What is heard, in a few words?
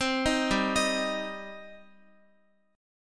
G Suspended 4th Sharp 5th G, Guitar; Gsus4#5 arpeggiated; x,x,10,8,7,10